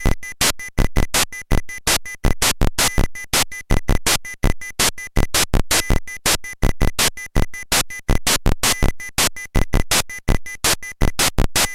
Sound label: 1bit